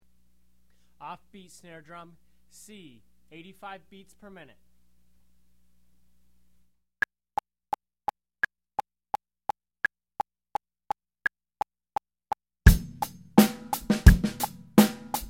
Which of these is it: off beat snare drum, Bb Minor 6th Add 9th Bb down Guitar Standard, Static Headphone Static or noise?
off beat snare drum